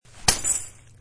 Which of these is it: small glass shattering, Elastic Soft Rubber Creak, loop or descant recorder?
small glass shattering